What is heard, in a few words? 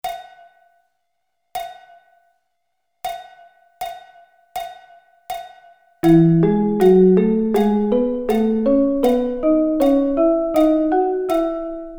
E Thirds